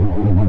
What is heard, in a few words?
phasemissile; Tanks